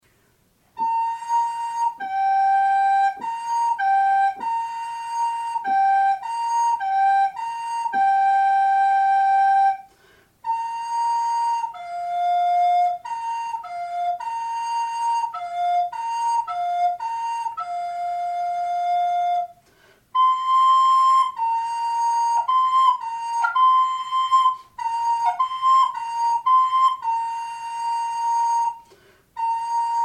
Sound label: FLUTE